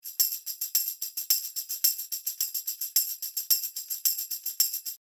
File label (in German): Tambourin 30cm; Austrian Audio OC818